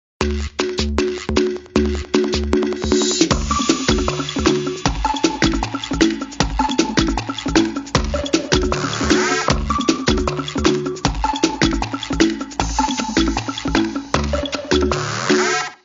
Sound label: Vertu Vortex Sms